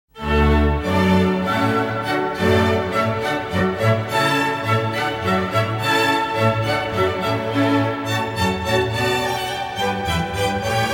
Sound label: Water Music (Cathedral); Handel